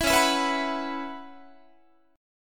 C sharp Minor 11th, Guitar; C#m11 strummed

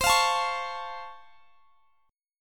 Db Augmented Major 7th, C

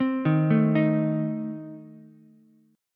A Diminished A 5,3,5,6 down Ukulele; Adim arpeggiated; JGuitar

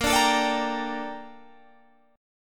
Bb Major 13th, Guitar, Open G

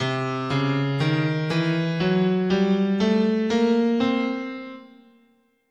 C Diminished Half Whole, scale

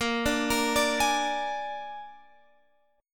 Bb 7th, Guitar, Open G; 20,19,20,19,21,x

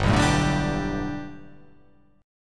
G Major Add 9th chord

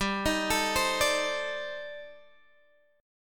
C Minor 6th Add 9th G Guitar Standard; Cm6add9/G arpeggiated; JGuitar

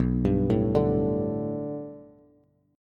B Minor 7th Sharp 5th B Bass, chord; Bm7#5; arpeggiated; JGuitar

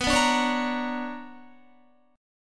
B Minor Major 9th, Guitar; BmM9 strummed; JGuitar

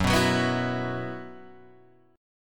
E Suspended 2nd Gb

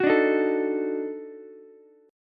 Eb Minor Major 7th Flat 5th Eb down Ukulele; EbmM7b5; strummed; JGuitar

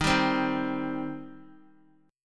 Eb 6th Eb down Guitar; strummed

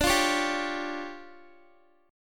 D Minor Major 7th Double Flat 5th, D, Guitar; DmM7bb5; strummed; JGuitar

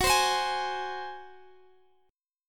F sharp Suspended 2nd Flat 5th, Guitar, DADGAD; chordshape; down

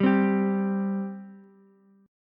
C 5th C Ukulele; C5 strummed; x,7,8,10

